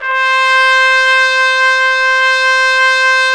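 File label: Solo Brass trumpet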